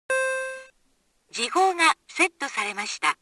Hourly, clock

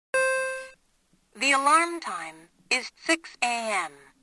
Alarm Time

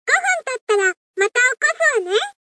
snooze

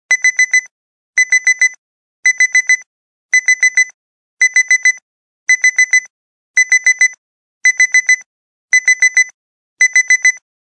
beep, clock

